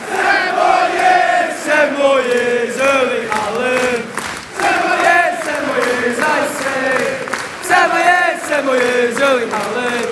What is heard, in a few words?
10 more years chant; erling haaland